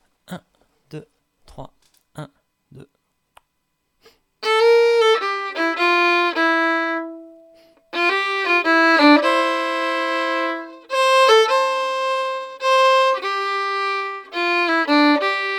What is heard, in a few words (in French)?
Passe-pied de Vieuxville - 2eme voix